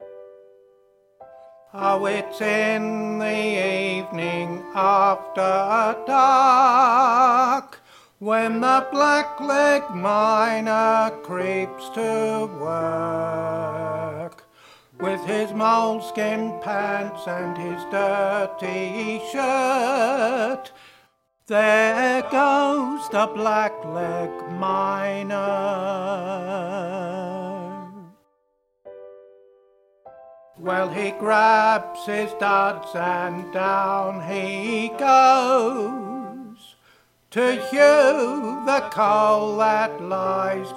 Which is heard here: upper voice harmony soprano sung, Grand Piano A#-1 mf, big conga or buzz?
upper voice harmony soprano sung